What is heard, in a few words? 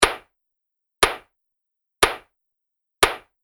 Knocking Wood Loop; Wood Sound Effects; Looping Sound Effect; Andrew Fleming